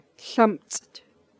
PICK

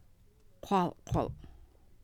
talking barking

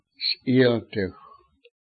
roof beam

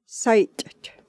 tickle

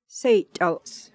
tickle

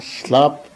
eat soup

